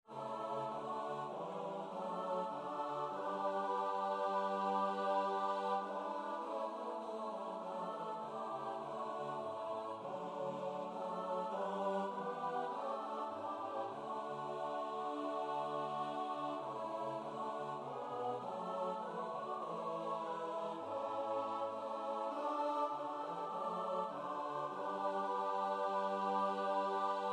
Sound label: midi; bach; bog wierny slowom swym choral